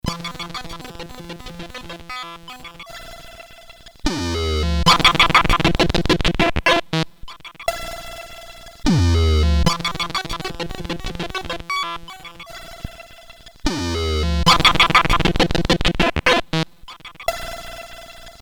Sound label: sid CSG8580; hvsc